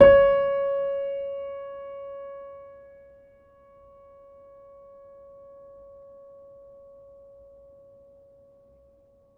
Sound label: Upright Piano; HSS OP Pack; healing soundscapes